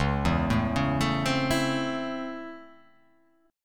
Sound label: C sharp Minor Major 7th Sharp 5th C sharp, chord